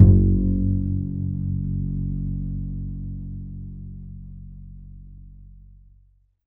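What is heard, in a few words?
double bass F#2, Jazzistic; DBASS; Univers Sons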